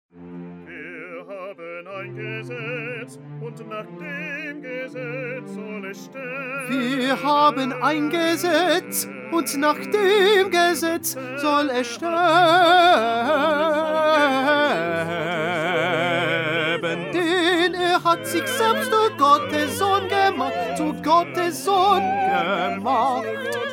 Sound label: Tenor; Bach; St John Passion